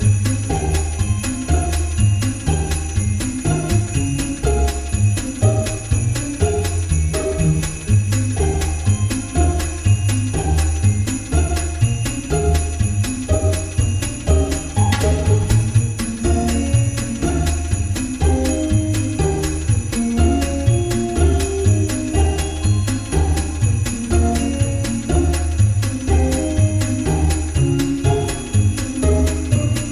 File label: ambience Bonus Game; secrets of christmas